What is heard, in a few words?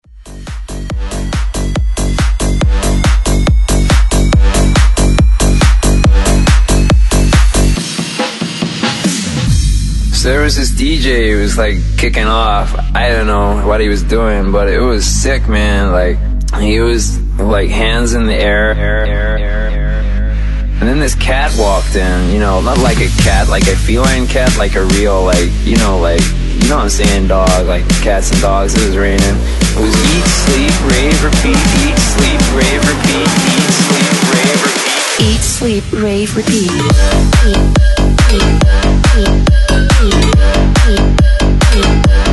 Vina Bounce Bootleg 140 Bpm